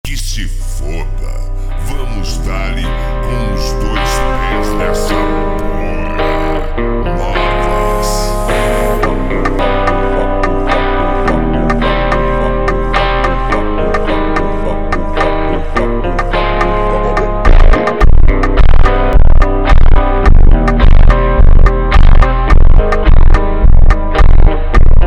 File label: Alt Version slowed